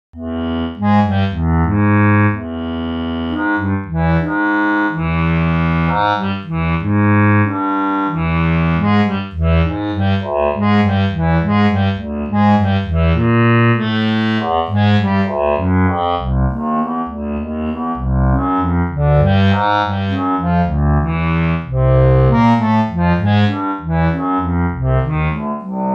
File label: Bass riff, Reeds; tuned